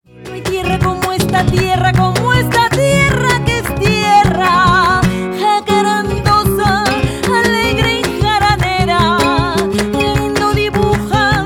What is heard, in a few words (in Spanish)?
Canto a mi tierra